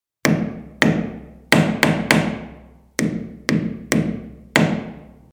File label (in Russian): zvuk molotka